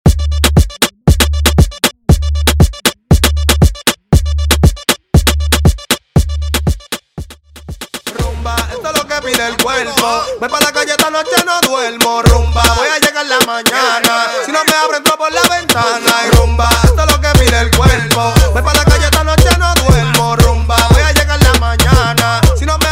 Rumba Intro Outro 118BPM

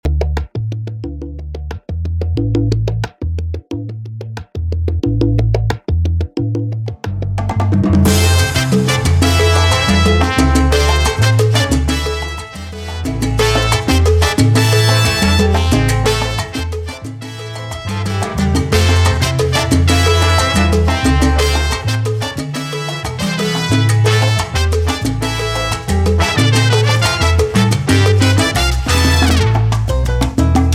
Intro Outro 90 BPM

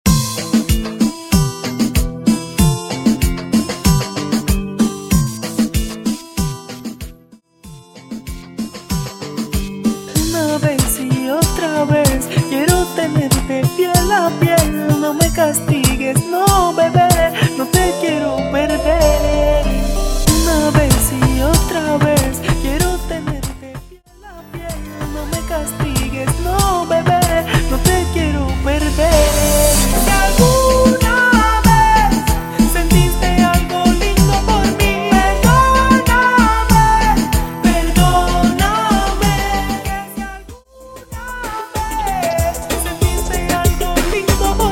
Intro Outro 95 BPM

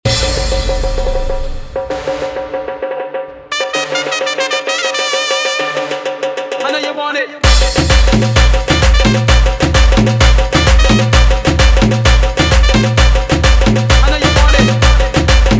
guaracha remix 130 bpm

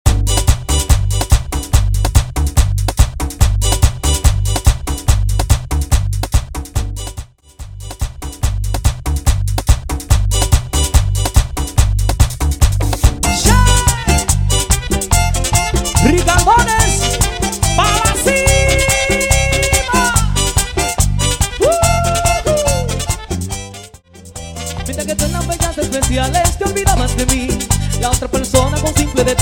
intro outro 143 BPM

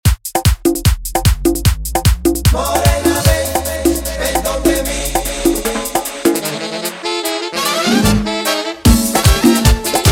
intro 150bpm

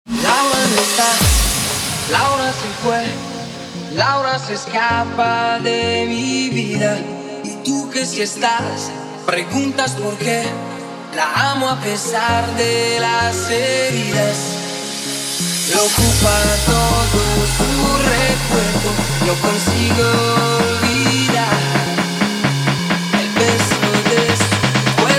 intro outro 130 bpm

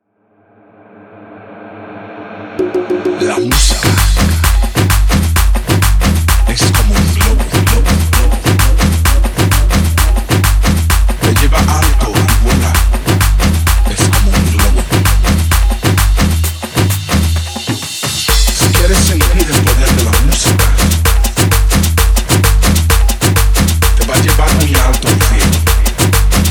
intro outro 130 bpm

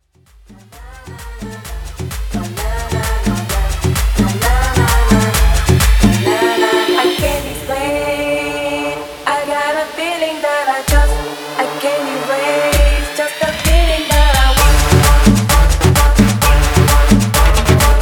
guaracha remix 130 bpm

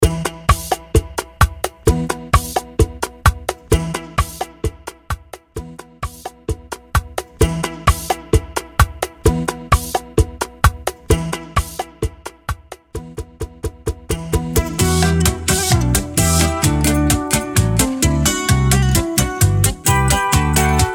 Intro Outro 130BPM

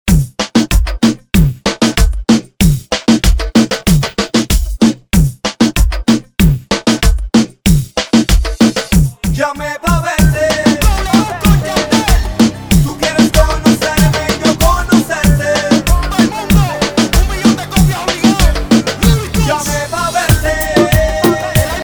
remix bpm 95

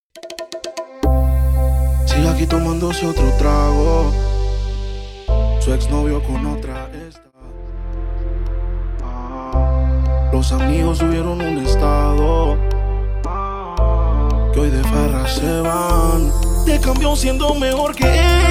Bachata Project 113 BPM; bachata bootleg remix

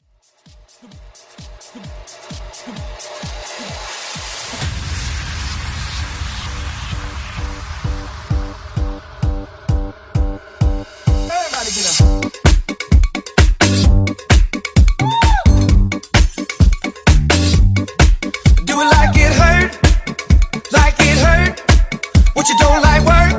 Mashup BIG ROOM; Mashup Edit

